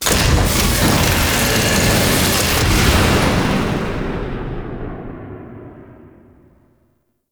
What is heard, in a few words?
Thumper Exit Ship; Warframe; Veilbreaker; Kahl; Grn Forest; Cinematics